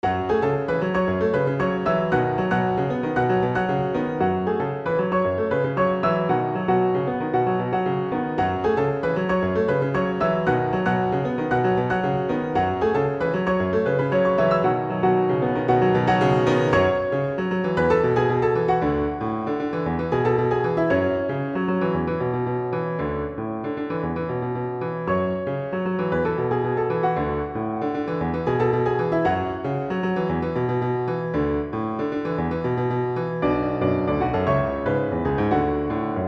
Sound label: underground piano; startropics